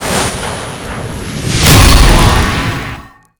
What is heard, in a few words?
Long-distance Totem; Summoner